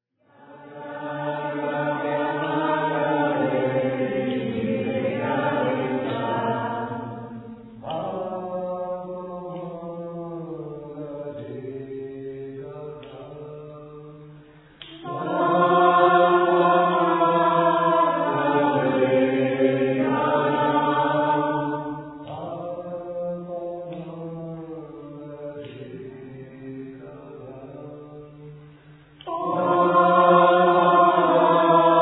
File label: music excercises, qawwali